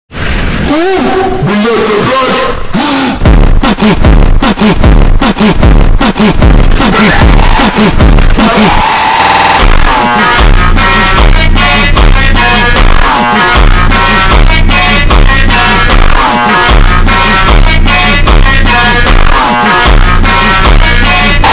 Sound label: funk super slowed reverb brazilian phonk